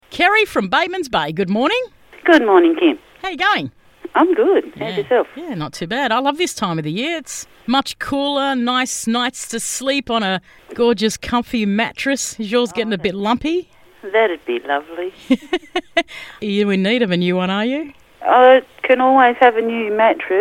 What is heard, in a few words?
surprise lullaby